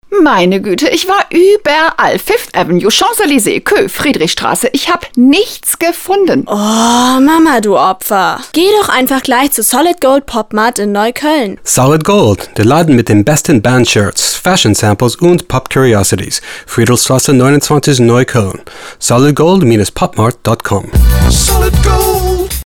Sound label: funk